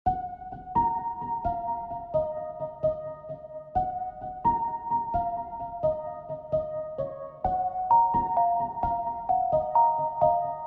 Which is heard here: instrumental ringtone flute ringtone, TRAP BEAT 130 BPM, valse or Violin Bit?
TRAP BEAT 130 BPM